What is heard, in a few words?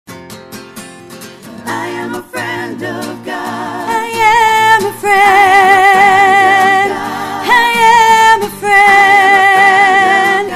alto end